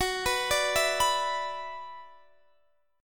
Gb 7th Suspended 4th Sharp 5th Gb down Guitar Standard; arpeggiated; JGuitar